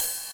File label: Ride; osu